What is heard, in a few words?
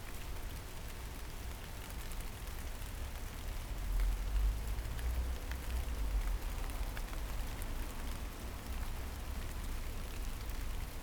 rain street; 99Sounds; Rain And Thunder